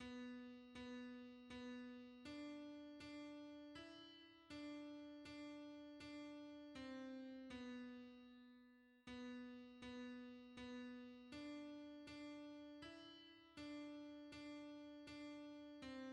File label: tenor, hymns; Seigneur sanctifie nos jours